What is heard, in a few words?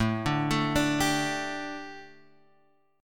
A 7th Suspended 4th, down, chord; A7sus4 arpeggiated